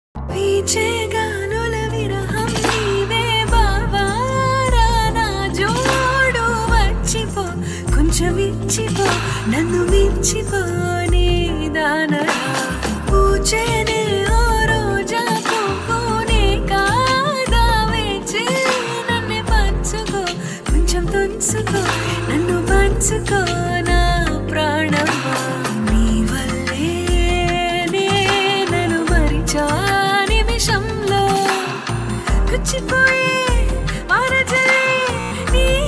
love bgm Telugu ringtone

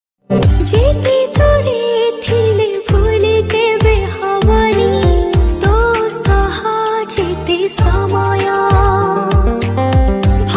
odia love story ringtone

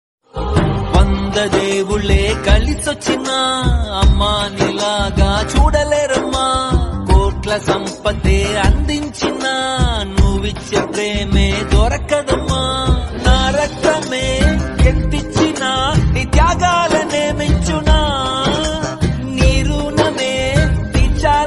telugu ringtone; bichagadu

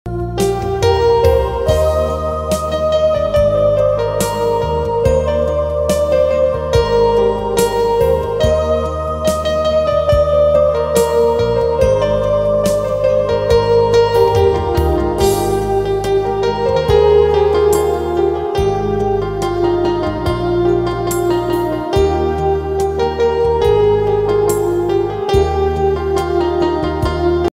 ringtone instrumental naat

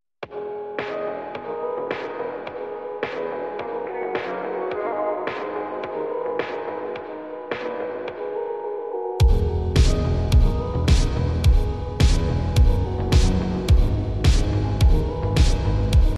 lofi ringtone

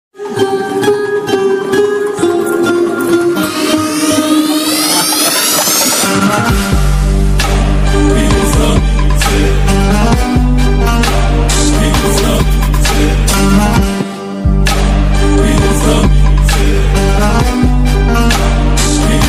bgm ringtone; mersal

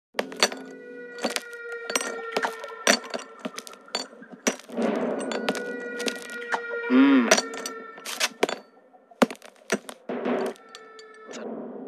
background music; gabbar singh

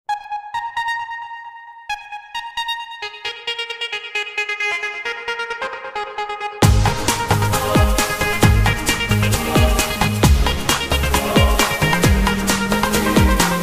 bgm ringtone tamil love ringtone

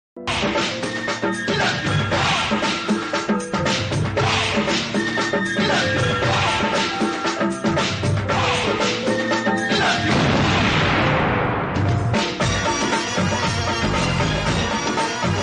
attitude bgm; upendra nenu movie

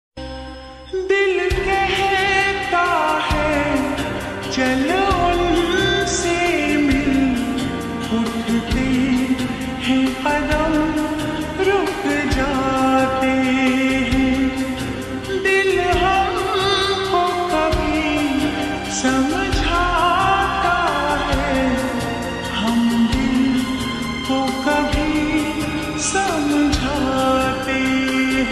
lofi ringtone slowed reverb; old bollywood ringtone

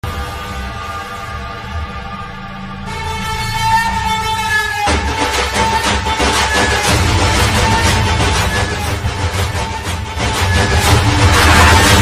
drums; kgf climax

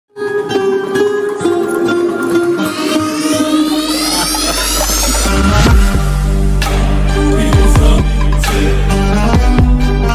magician bgm ringtone; mersal

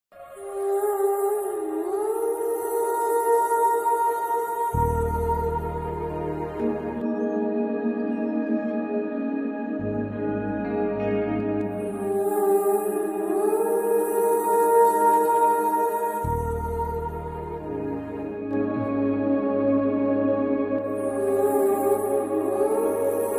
sad bgm; majili

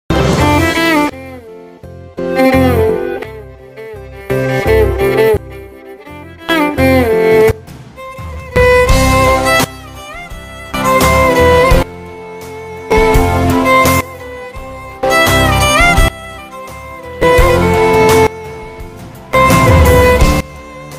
violin version bgm ringtone love feel; ishaqzaade

